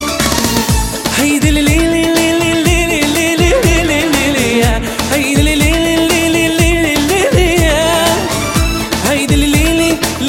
Jewish wedding

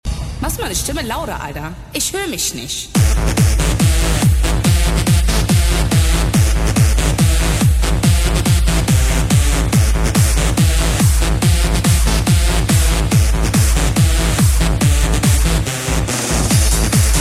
Bass

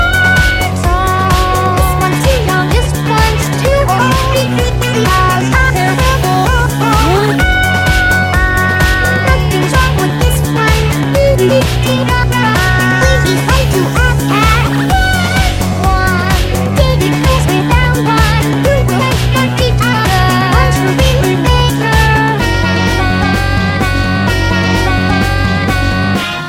Groove; Sweetie Belle

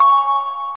Hah

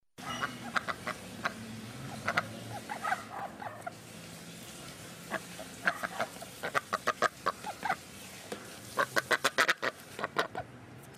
Duck

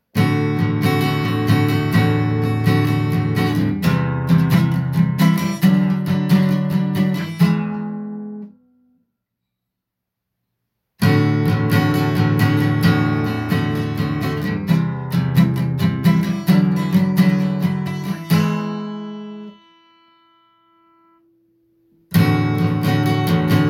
Guitar Memory

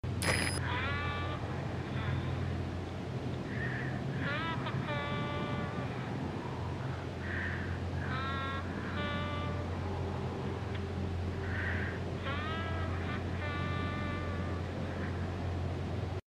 Quiet Humming

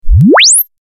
Laser Sweep

